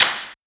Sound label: EDM Future Clap